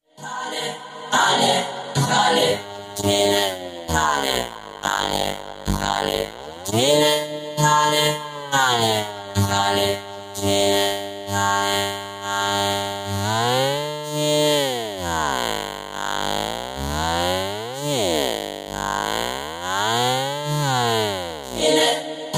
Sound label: evo vocoder